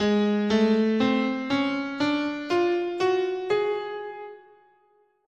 Oriental, scale, guitar; Gsharp

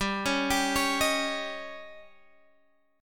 D sharp 7th G, guitar

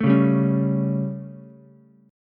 G Suspended 2nd Flat 5th G Ukulele; Gsus2b5 strummed; JGuitar

